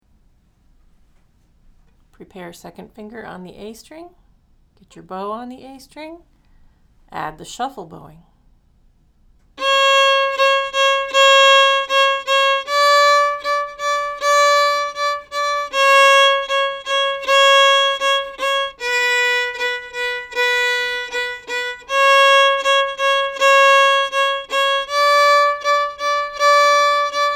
Boil Em Cabbage Shuffle

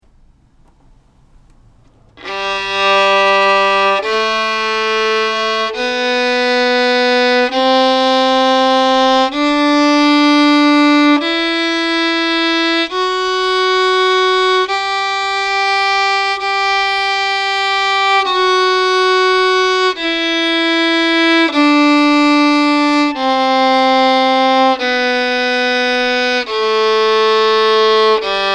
Scale G low octave

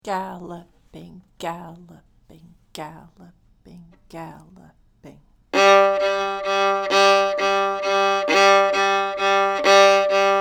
Galloping

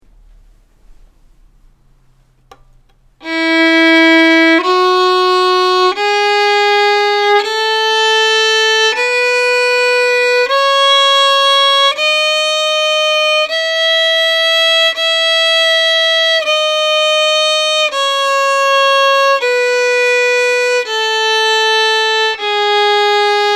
Scale E low octave

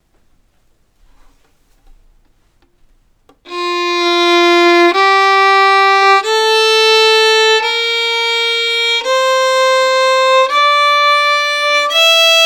Scale F high octave

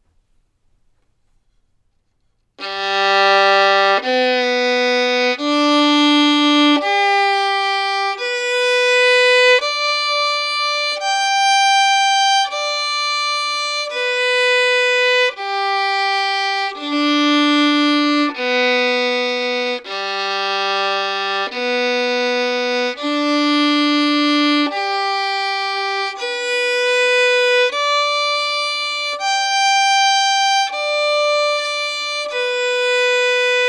Arpeggio G Major 2 octave

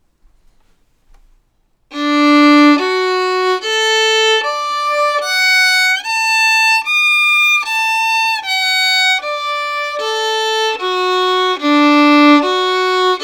Arpeggio D Major

